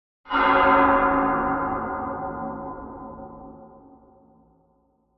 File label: Campana Bell